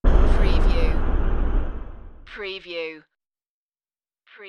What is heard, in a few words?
Whoosh